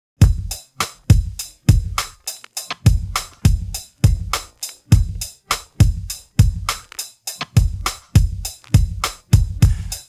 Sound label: pattern rim, drums